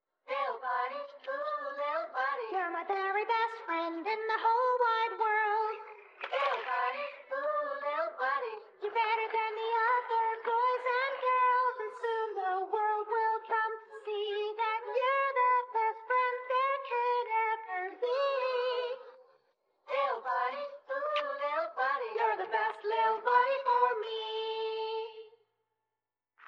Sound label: Commercial Song vocals